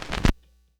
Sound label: Needle Up Old, Vinyl Record Samples; Needle Noises